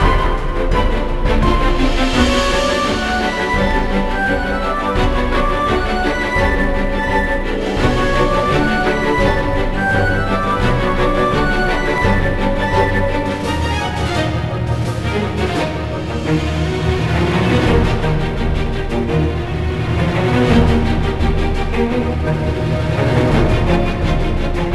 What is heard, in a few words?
Music Race; game rip; Legend of the Guardians The Owls of Ga'Hoole; nds